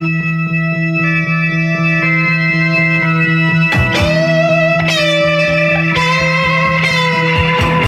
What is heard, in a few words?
guitar